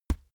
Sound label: boxing gloves batter soft; sport freizeit; boxen